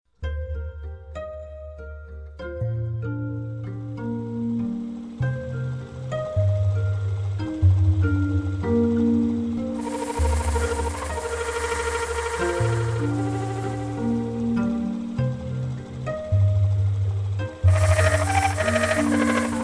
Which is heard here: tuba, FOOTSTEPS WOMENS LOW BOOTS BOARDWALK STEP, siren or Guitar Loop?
siren